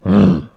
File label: zombie roar; pygame tile